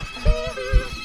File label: Hee Hee; Ayuwoki